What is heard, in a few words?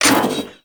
Spear Gun Mech; Hoplite